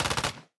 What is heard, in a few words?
heroes attack; goblidrone